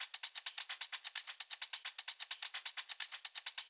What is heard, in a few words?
Live Percussion, 130 BPM